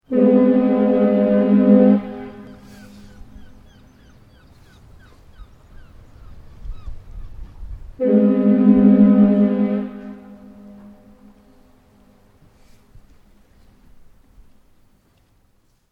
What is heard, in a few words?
Ships Hooter, Large ship Liner 2 Hoots - gulls background; Boats; Vehicles; Tom Hutchings